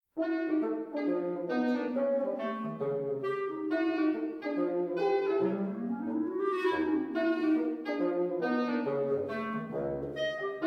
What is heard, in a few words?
Americana Wind Quintet City Shuffle; CRYSTAL RECORDS